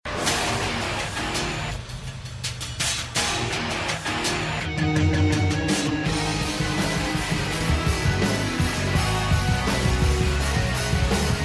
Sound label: BGM